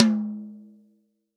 TOM, Real Drum Kit, POP KIT; Best Service ProSamples vol.43

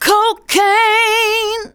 vocals sung; Techno Trance Essentials

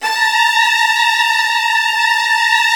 A3, Strings, STR HLF TRLL; ILIO; Kirk Hunter Virtuoso Strings VOL-3; 90 s Sample CDs

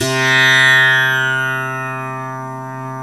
SITAR C2

